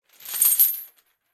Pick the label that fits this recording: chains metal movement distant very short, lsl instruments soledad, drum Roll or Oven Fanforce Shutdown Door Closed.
chains metal movement distant very short